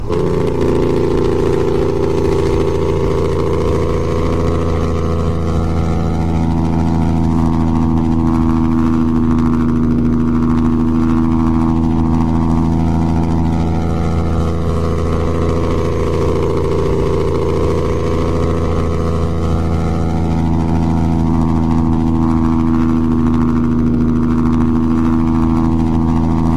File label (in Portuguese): Freio Motor